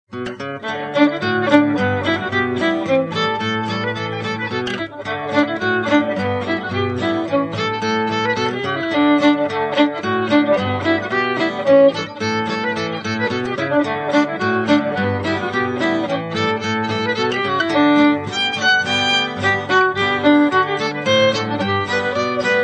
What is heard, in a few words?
Broken Wing Swing, stringtet